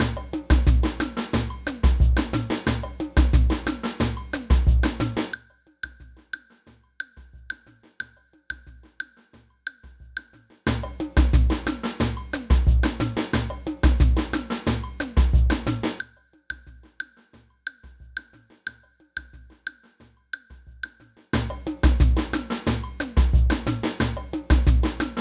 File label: block, samba